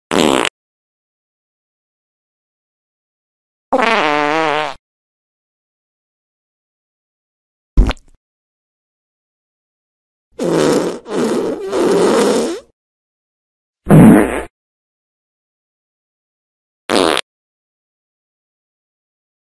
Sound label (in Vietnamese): Âm thanh tiêu chảy ỉa chảy